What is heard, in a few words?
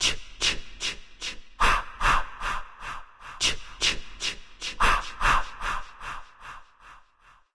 Ch ch ch ah ah ah Full; friday the 13th killer puzzle